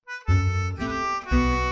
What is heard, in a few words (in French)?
chanson regarde brin herbe